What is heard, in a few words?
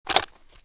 open; cw m203